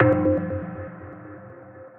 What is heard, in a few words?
Bells, Majestic Mallets; Ennio's Bells; Ableton; Mood Reel